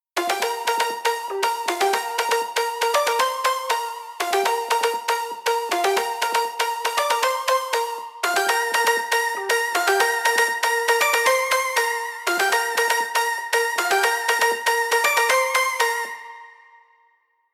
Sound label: melody